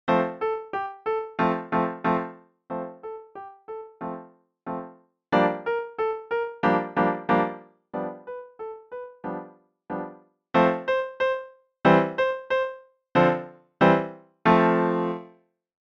dance piano solo; kabalevsky

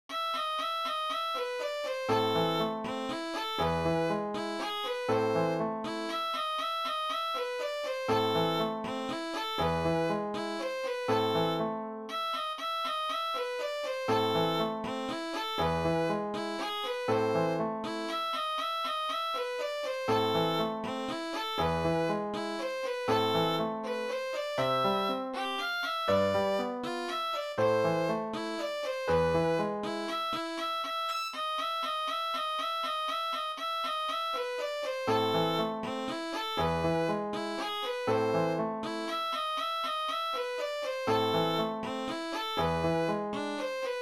violin solo

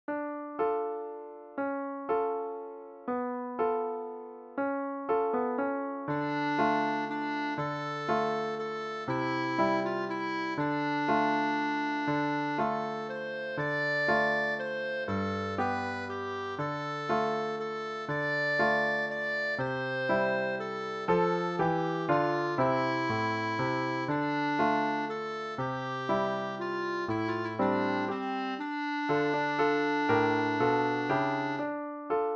clarinet solo; scarborough fair

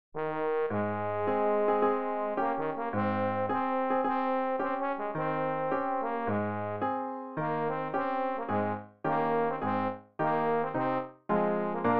march trombone solo; triumphal

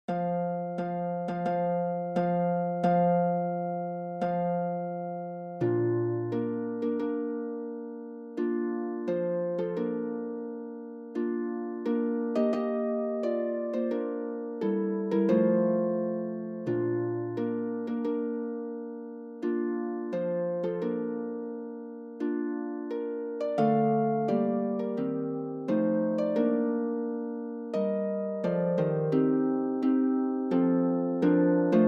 harp

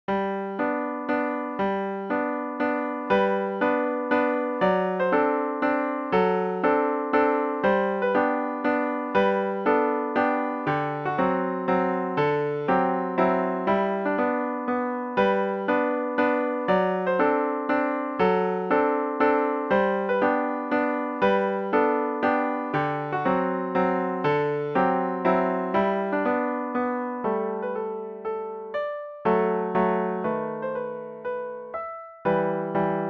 la donna e mobile piano

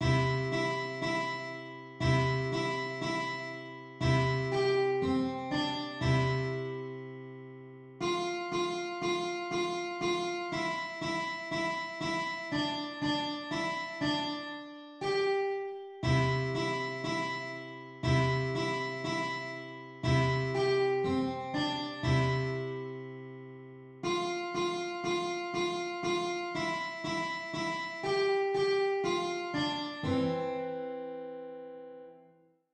guitar

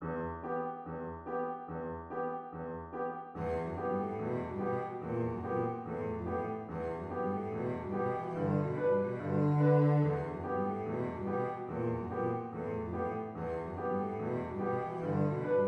cello

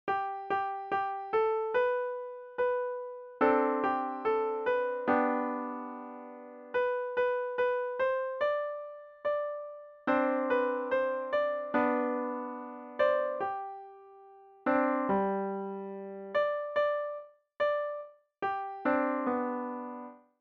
piano

